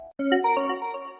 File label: kik sms